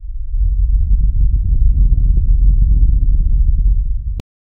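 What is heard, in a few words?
low rumbling dark ambience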